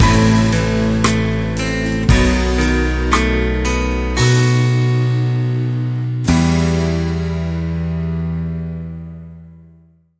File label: Jester Laugh, Sting Mysterious